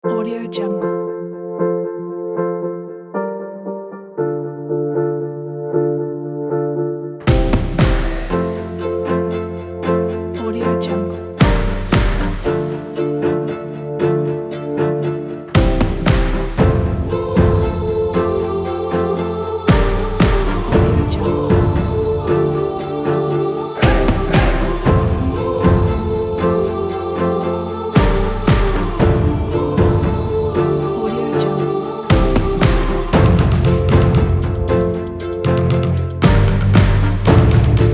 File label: big indie orchestra